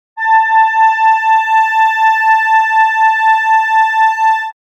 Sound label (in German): Oktave Schwebung